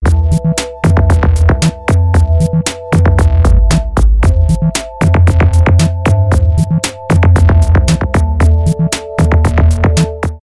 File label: Moody Electronic Atmosphere Vox